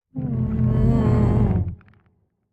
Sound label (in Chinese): 环境声; 监守者